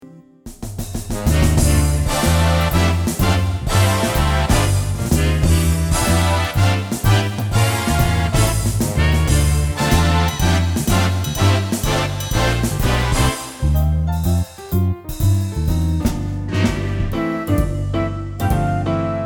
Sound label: jazz and big bands